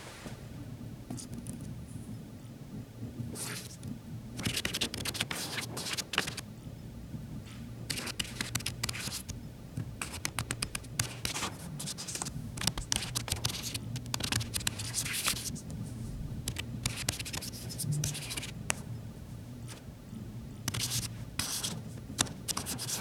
the soft whispering of ma; leisure

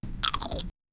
chewing sound; eating

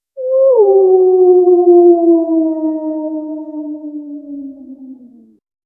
a gentle hoot like; wise

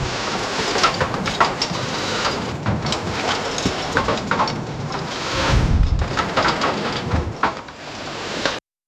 fast urgent rope ladder; nautical

